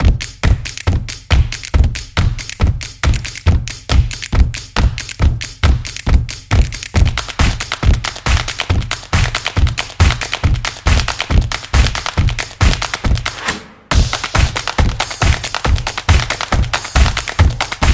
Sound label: Claps